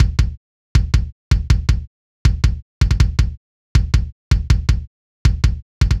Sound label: Industrial 160 Kick; Drums; Loops; Drum Construction Kits; Ghosthack; Drum Mega Bundle; Drum Hero 5